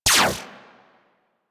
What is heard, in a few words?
Shot, Weapons; Warframe; Moa Pet; Multron